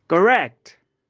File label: correct; grade3; math; pictography